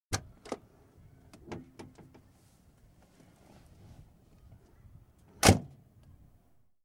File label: Rolls Royce Silver Spirit, exterior, door opened & closed; 6.75 litres, 1986 model; Car; Car Sound Effects; BBC